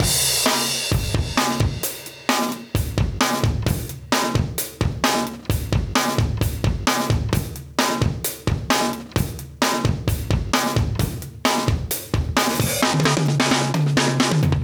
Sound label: 131 live breaks